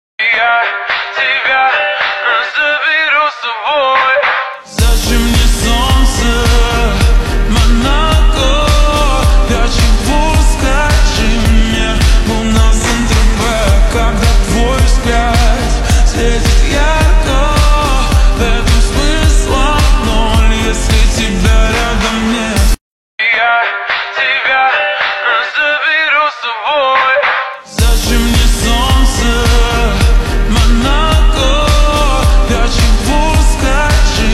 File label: sad mood remix